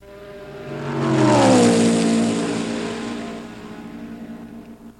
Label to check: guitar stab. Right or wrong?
Wrong